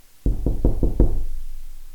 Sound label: Five Knocks On Closet Door